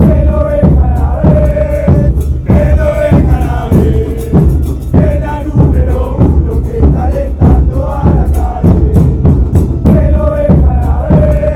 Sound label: the ones cheering